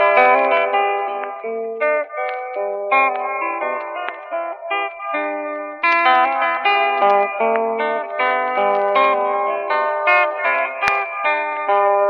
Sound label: guitar, Loops, Phonky